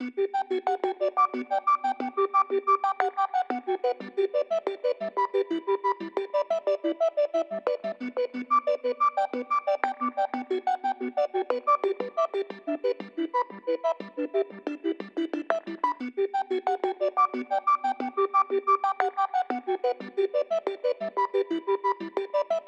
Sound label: Ambient; Splatoon 2; Inkopolis Square - The Shoal (Ambient A)